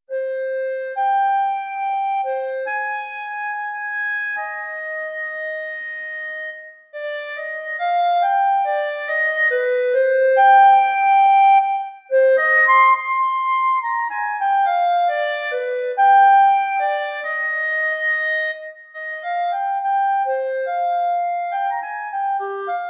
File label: Clarinet; sibelius sounds